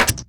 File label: Button Heavy; Smashy Road Arena android ios 2016